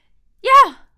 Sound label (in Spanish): Grito De Mujer